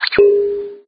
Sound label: scissors hit; There; ThereClient